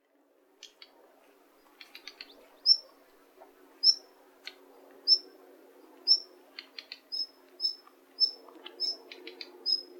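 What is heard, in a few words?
jobitaki, daurian redstart; watarase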